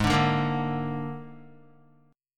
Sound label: Ab Suspended 2nd Flat 5th Ab Guitar; Listen to Absus2b5 strummed; JGuitar